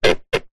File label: household sofa arm creak